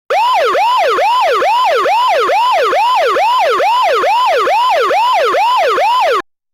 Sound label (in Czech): melodie